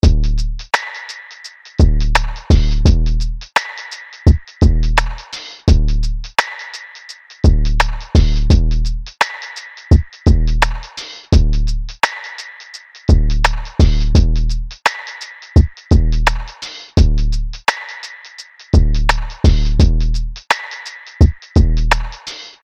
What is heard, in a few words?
Lofi Drum Loop 170BPM